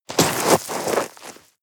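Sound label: step gravel debris hard, footsteps; schritte; menschen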